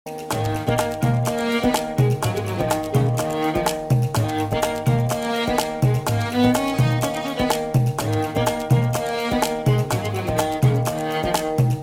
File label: Great Instrumental